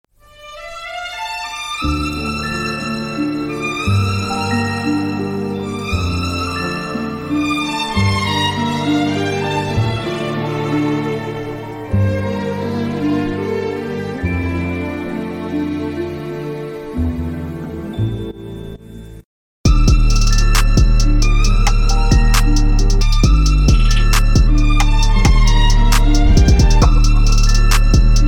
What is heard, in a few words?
Trap Version; Angel Beats